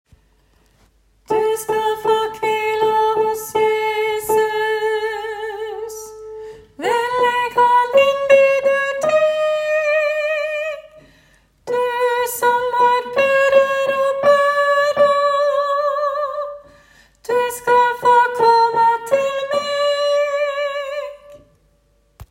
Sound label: tenor; du skal fa kvila hos jesus; åfjord